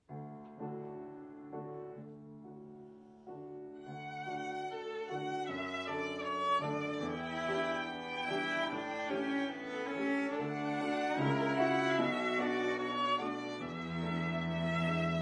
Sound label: Salut d'Amour, piano trio